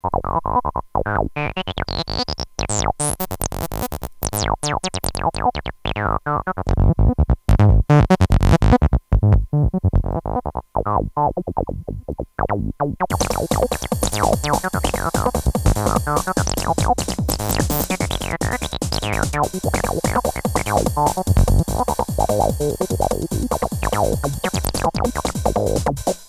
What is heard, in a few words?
saw